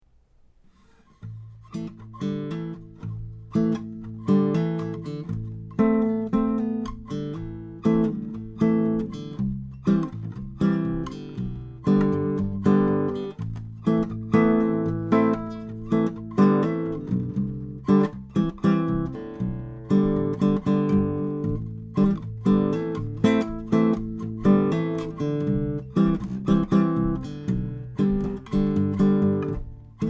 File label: Pop; Bartolex